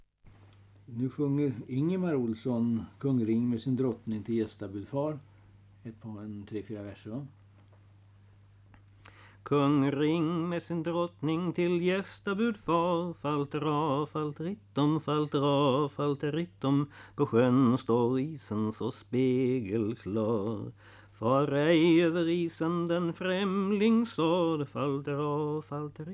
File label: Folk music of Småland; Kung Ring med sin drottning till gästabud for